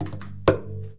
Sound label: tapping, cello